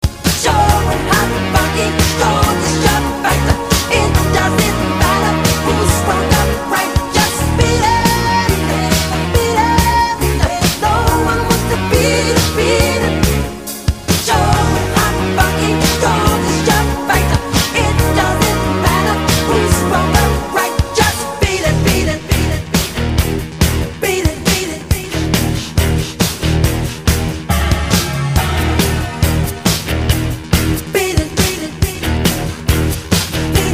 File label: loop